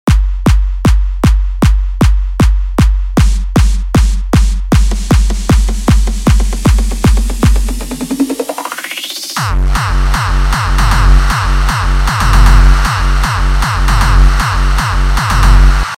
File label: hardstyle sample; EDM; RAW KICK; スネアロール; クラップ; RAW KICK以外の部分では、バスドラの4つ打ちと同時にクラップを鳴らす; スネアロールで盛り上げる; シンバル類はあまり使わない（とくにRAW KICK部分では使わない）